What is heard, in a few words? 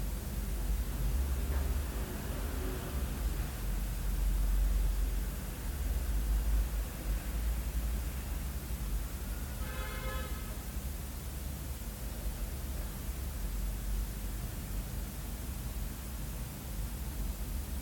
traffic noise bedroom sample